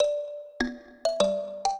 trio, mbira; Mozart

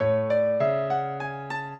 minuet, piano; Mozart

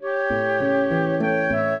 trio, flute harp; Mozart

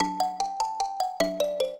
trio, mbira; Mozart

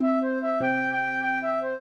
trio, flute harp; Mozart

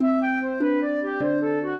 trio, flute harp; Mozart

